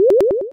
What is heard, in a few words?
chat notif